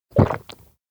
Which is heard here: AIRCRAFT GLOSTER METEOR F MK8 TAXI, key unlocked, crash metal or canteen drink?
canteen drink